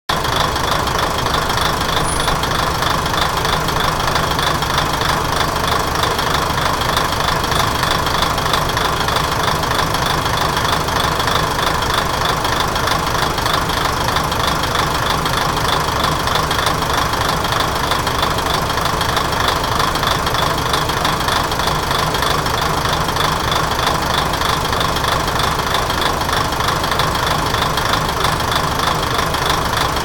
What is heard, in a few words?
idle, rail; kominato